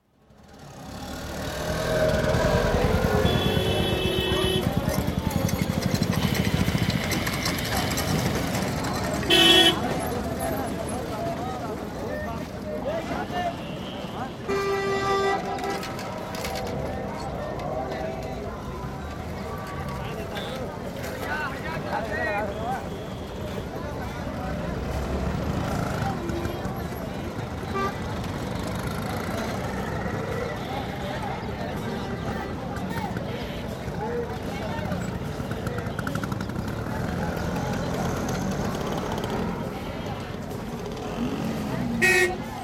Old Delhi: Chandni Chowk, heavy traffic (rickshaws & cars), vendors, some distinct speech; India; City Sound Effects; BBC